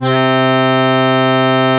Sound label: tuba loop note